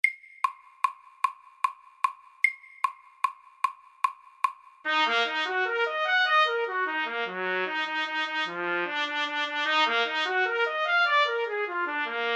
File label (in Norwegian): Etyde i F moll MIDDELS